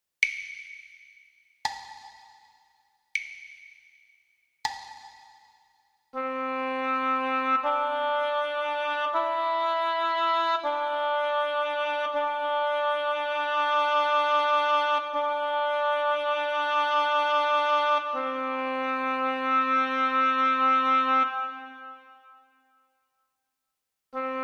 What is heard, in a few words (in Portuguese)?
percepcao ditado